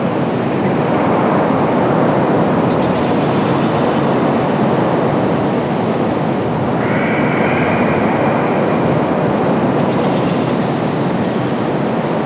Desert Main Wind Loop; moul

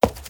Stone Run

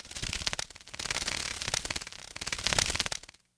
Bird Fly By

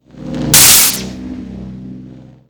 Lightning Flash

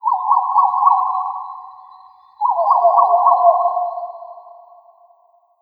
Forest Amb

